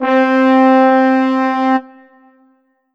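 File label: Ensoniq ZR-76 French Horn GM C4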